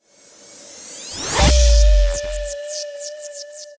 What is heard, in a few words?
woosh boom